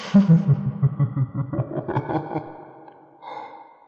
evil laugh

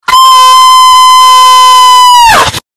screaming; adventure time finn

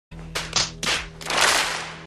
facepalm

